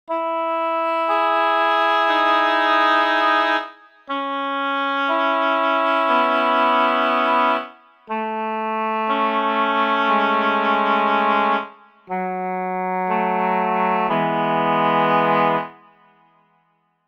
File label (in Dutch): Inzingoefening met secundes SAB